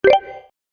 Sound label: Positive Win Mini Bonus, Video Game Sound Effects